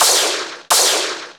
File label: Gunshot